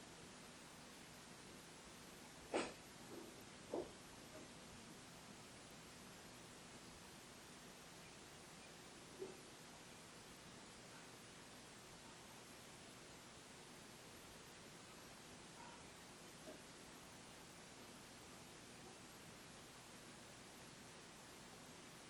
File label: Inside Tattoo Shop